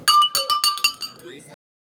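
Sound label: a group of people cheering, pub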